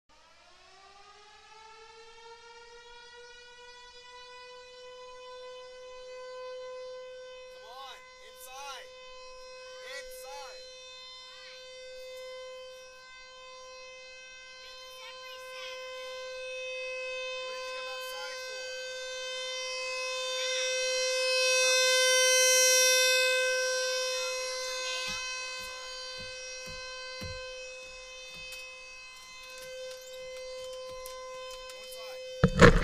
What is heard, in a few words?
Full Alert, sirens; omaha; T-128